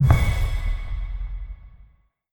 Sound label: wild Land; netent